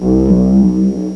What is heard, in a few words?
HAT BACK